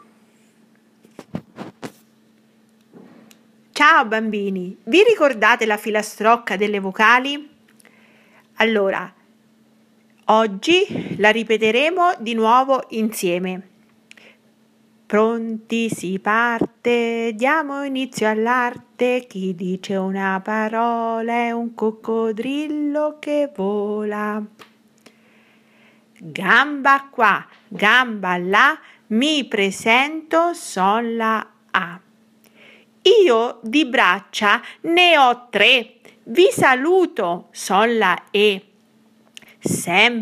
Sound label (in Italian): Filastrocca delle vocali